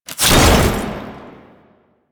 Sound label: Ammo Cast Whoosh; DE; Warframe; Frumentarius